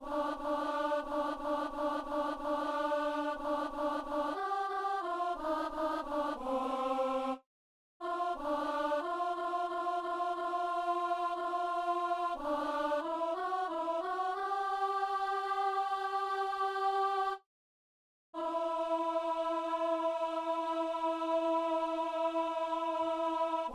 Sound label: alto; Allez par toute la terre